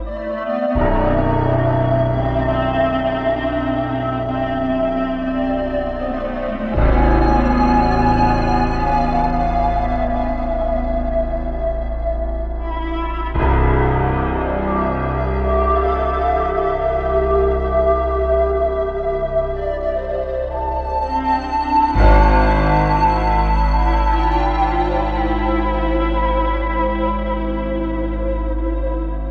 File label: boss intro intensity loop 172bpm; chicory a colorful tale; ps4